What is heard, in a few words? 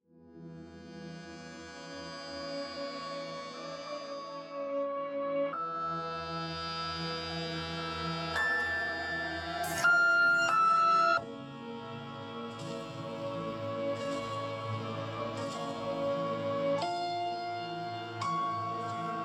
Trippy Melody 85bpm D min